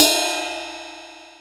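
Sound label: R8 ride; X-Static Goldmine 1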